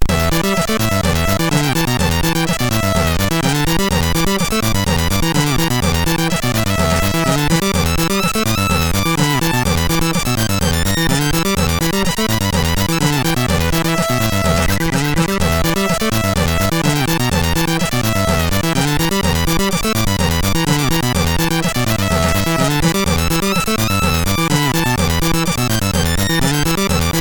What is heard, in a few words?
Slapbass, MOS6581R3; hvsc